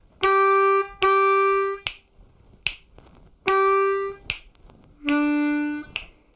12 Bar Blues Lick, harmonica

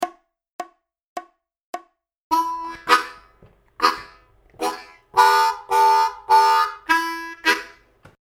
Fun Solo Chugging Licks Lick 105BPM, harmonica

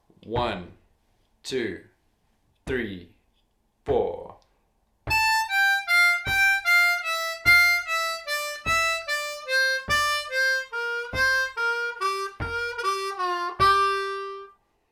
Fun Triplet Run Down Slow, harmonica

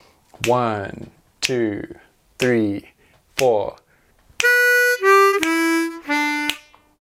12 Bar Blues Turnaround Slow, harmonica

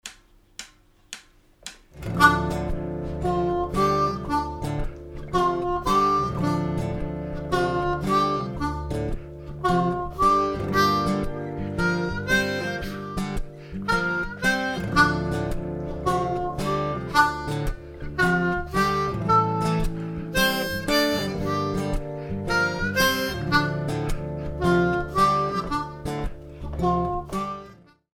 Bass Line Play Through Full Speed, harmonica

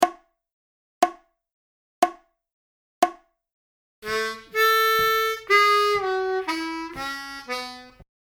12 Bar Country Style Blues Lick 60BPM, harmonica

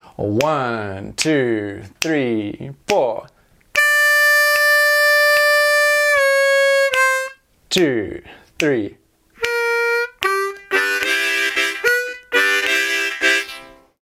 12 Bar Blues Lick, harmonica

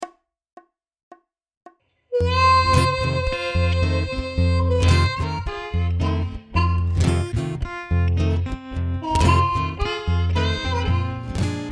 Play through, harmonica

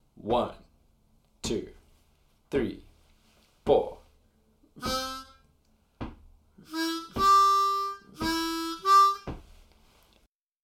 Lick bass line, harmonica

